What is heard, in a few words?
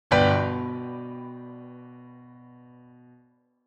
loud g chord